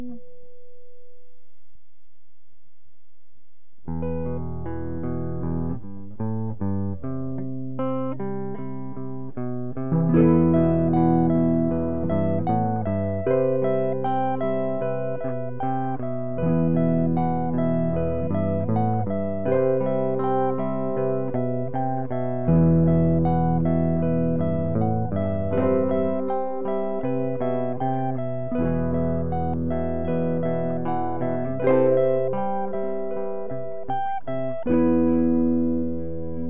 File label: tremol